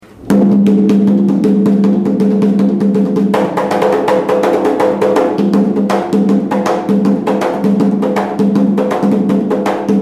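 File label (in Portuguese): barravento, atabaque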